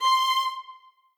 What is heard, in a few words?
string